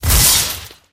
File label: crystal start; Minecraft; Votive Content